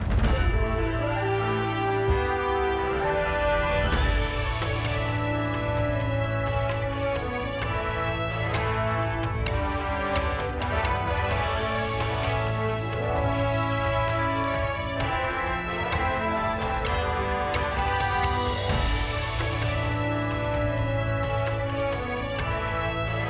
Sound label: Victory Fanfare; Monochrome Mobius Rights and Wrongs Forgotten; PS4 PS5 Windows gamerip 2022